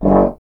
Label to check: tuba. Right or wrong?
Right